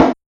Snare, Realistic Concertmate-670; One Shot Kit

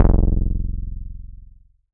BASS SH09 F0, Synths, Roland SH-09, Bass

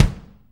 Room Kick, Room Drums; Akai MPC500

